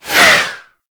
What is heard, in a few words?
Heavy Orbital Strike Whiz By; Abilities; Warframe; Tau; Dax Heavy